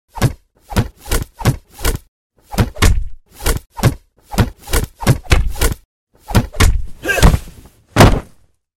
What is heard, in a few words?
Block-Punch-Body Fall Combo; Hollywood Edge; Martial Arts and Human Impacts